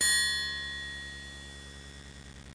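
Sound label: triangle; WAV Sound Effects; music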